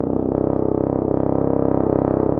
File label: TUBA